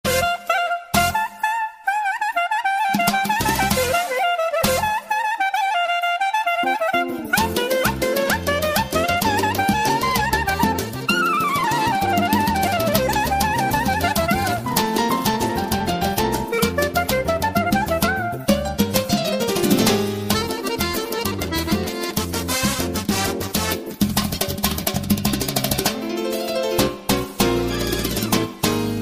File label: Caucasus Dance Melod